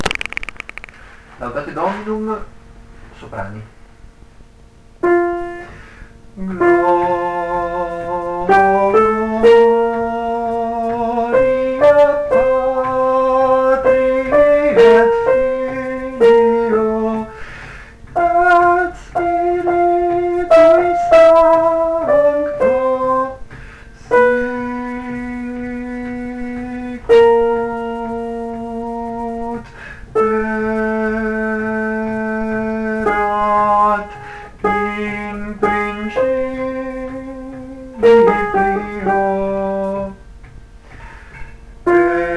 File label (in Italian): soprani; Mozart Laudate Dominum